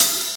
good open hi hat A# key, open hi hat sound tuned to the A# note